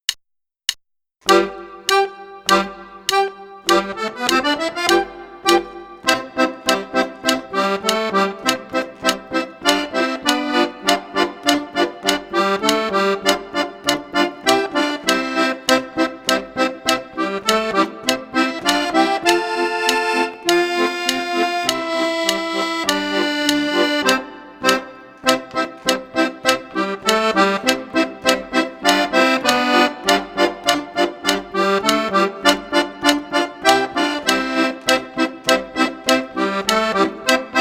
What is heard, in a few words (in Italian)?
fisarmonica; spartiti facili per fisarmonica ad uso didattico per bambini